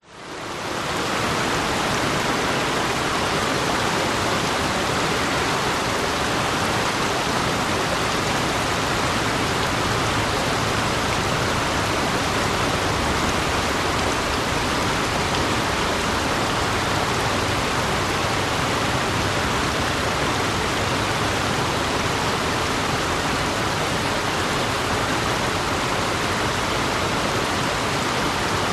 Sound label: river wide flowing light dist traffic vietnam